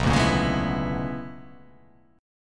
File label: B Minor Major 13th, chord; BmM13; strummed; x,2,0,3,1,3,1,1; JGuitar